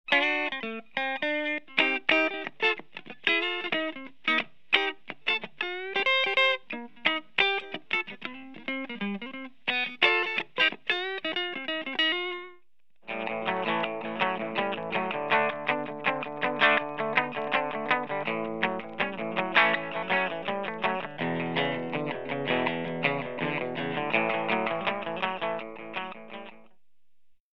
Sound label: clean Tweed DeLuxe